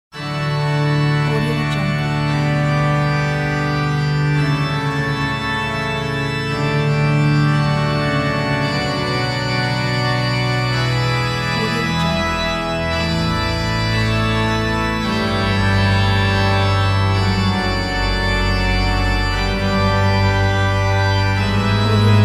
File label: church organ